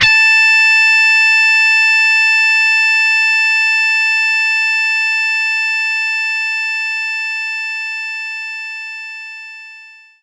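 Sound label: Distortion Guitar A2; knights try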